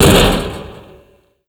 land tin roof, footsteps

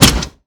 Aircrafts Engines Packard Merlin Prop; DCS

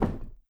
door large wood lock movement stop; Rust

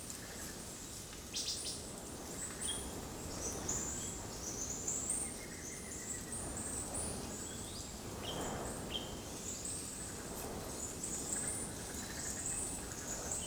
ambience desert forest day calm dry; Rust

